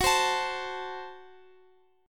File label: Gb Diminished, down, Guitar, Open F; Gbdim strummed